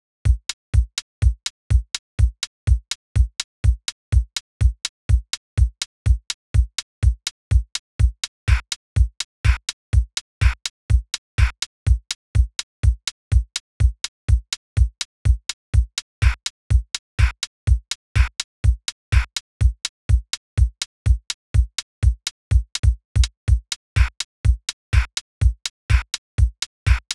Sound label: KIK+HATS+SNR